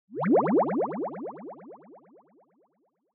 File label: Fantasize Low; シーン・妄想